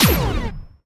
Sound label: pulse; weapons